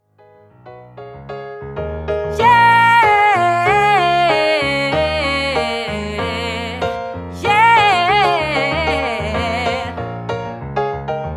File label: yea, women